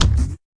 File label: fx drum